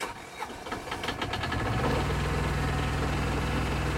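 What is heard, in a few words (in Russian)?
спокойный запуск двигателя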